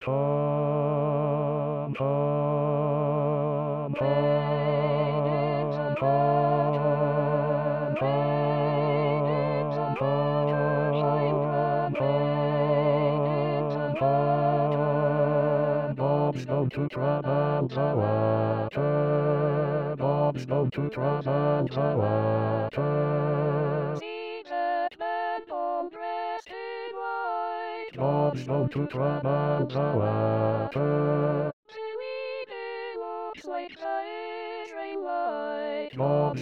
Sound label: Basse Wade in the Water